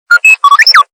Happy Agreement; Motorola; Droid 2 R2 D2

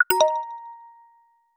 Nokia Message; X100

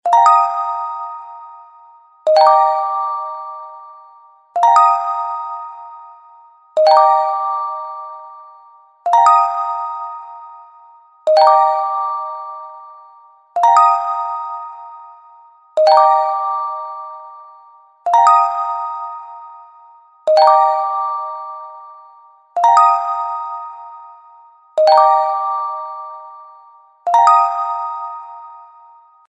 Bell Curve; HTC; HD7

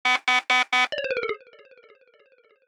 Low Battery; Lenovo; Z2-Vibe

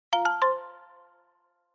Message, notifications

